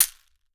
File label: CAXAII, Definitive Percussion